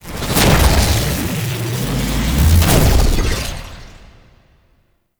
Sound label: Mod Cycle Confirm; Riven Mod